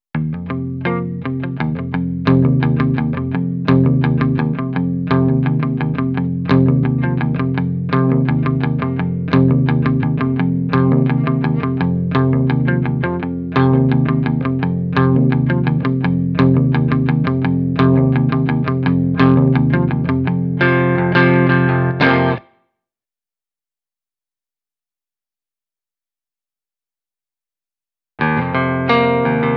Clean Guitar

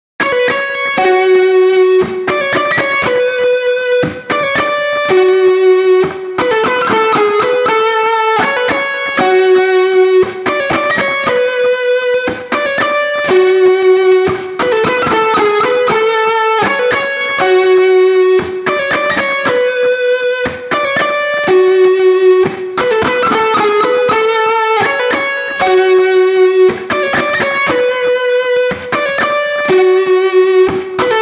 Intro Lead Left

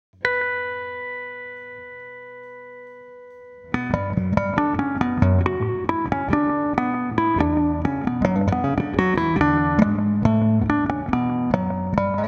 Clean Intro

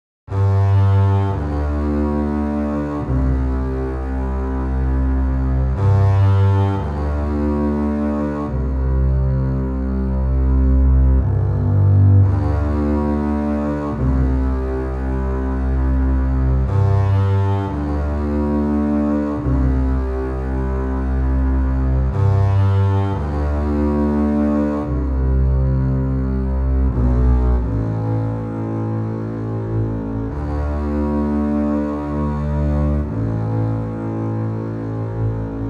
Basses

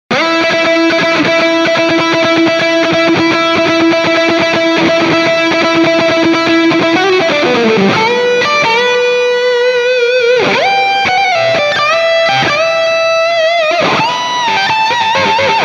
Harmony Solo

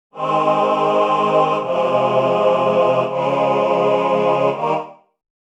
Classical Male Ensemble

